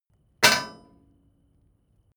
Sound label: metal impact bright short loose